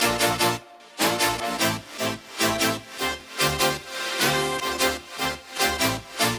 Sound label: Melody